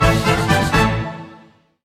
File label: music stinger; Henry Danger - Day Of Danger!